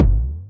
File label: big ball; Ultranium 3D